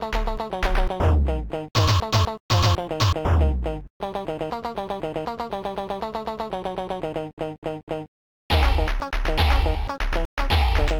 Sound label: funk slap